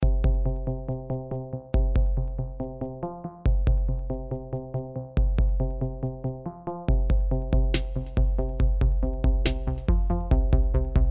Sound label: slime music; Adventure Time - Elemental; ThrillGames